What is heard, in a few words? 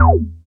BASS, Tekno Industrial; East West